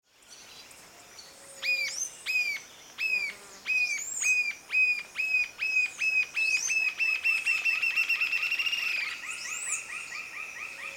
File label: male